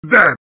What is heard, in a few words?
ded sound effect